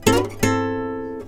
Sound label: Nylon Gtr Riff; Mo' Phatt; Digital Sound Factory Mo' Phatt